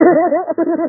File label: laugh